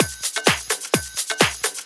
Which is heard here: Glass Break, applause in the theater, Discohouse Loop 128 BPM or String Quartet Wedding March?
Discohouse Loop 128 BPM